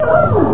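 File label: door squeak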